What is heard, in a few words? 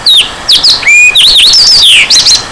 sparrow song